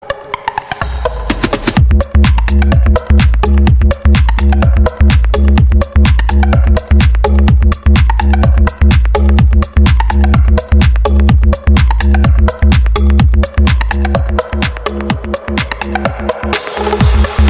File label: record break minimal, ringtones electronic